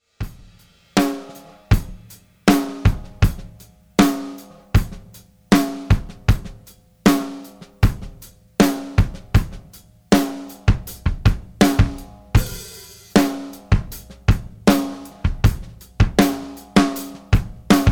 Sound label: DRUM